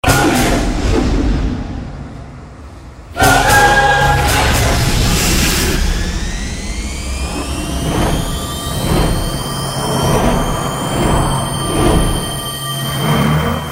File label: Opening Bgm; Yuva